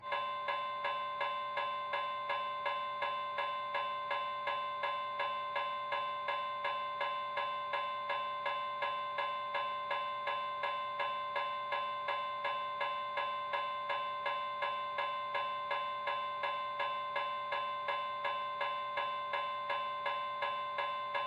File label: Early GS, Electronic, General Signals